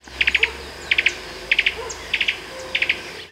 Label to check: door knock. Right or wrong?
Wrong